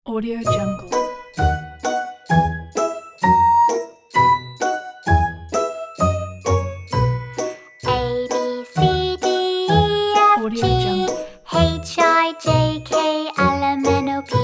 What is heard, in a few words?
abc alphabet song